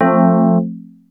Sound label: wurlitzer, dx7